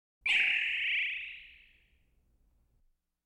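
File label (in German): kosmische entladung; Geraeusche; Science Fiction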